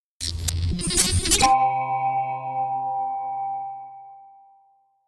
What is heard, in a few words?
Simple Hi Tech Logo